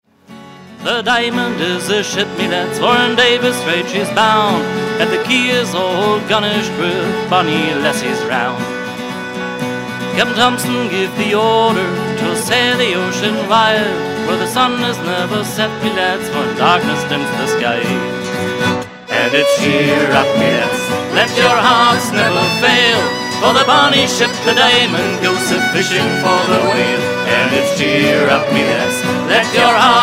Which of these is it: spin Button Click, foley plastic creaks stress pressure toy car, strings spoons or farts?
strings spoons